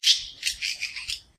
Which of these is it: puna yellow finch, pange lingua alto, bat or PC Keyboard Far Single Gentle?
bat